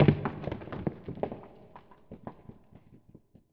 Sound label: rocks; The Elder Scrolls III - Morrowind